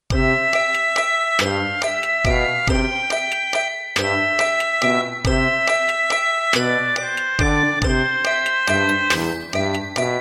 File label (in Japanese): ほのぼのカートゥーン